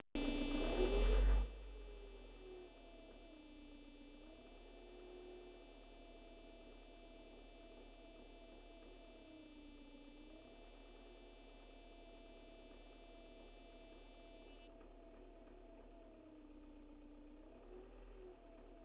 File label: alternator